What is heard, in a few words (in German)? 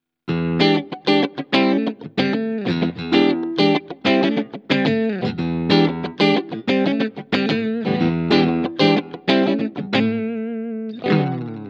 Schecter C 1 Exotic Beide Pickups Split Clean